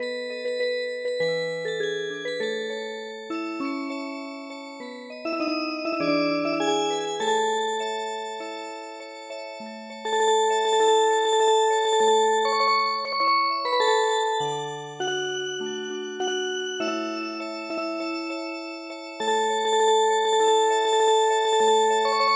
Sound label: Music Box, traditional